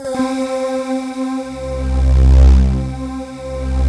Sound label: C3, Synth Line; 90 s Sample CDs; NiceBeats; SynthLine VOL-2